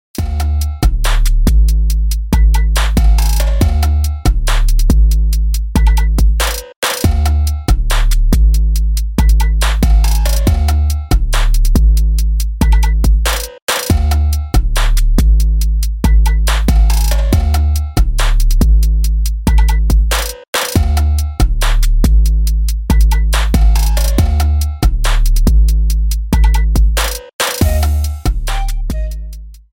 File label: Trap beats Overdrive; MIUI12